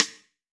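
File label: ambient rim, GM drum set; AKAI S-Series CD-ROM Sound Library VOL-8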